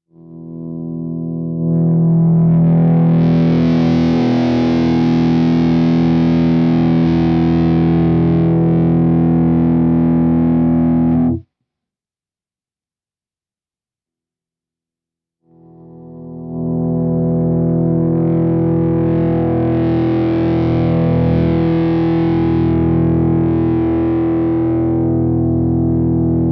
Cort KX 500 Volume both voices

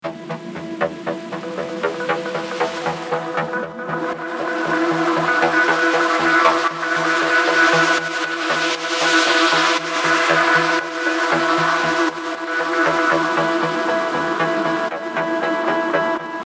clean drones

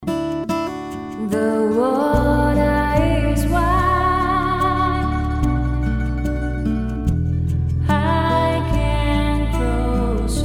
Hall On Vocals Only; Roland

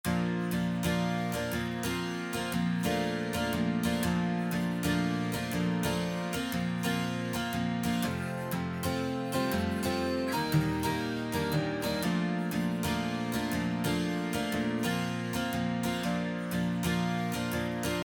Strummed

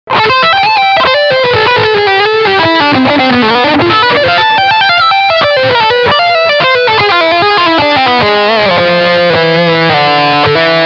Lick Distortion Bridge EMG

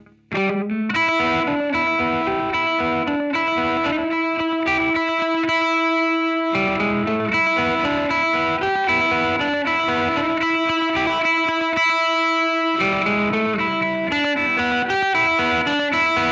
Country Twang; Boss GT-1000 Core

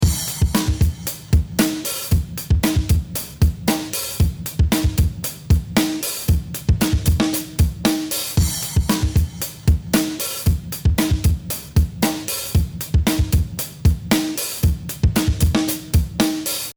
Drums Big Mid Tempo Rock

